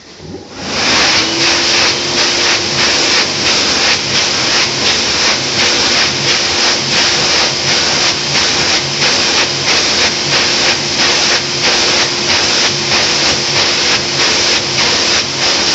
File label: hand dryer solos, village; portmeirion